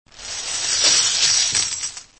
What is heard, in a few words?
drop Coin; chess; 贝密游戏